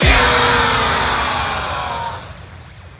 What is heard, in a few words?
Crash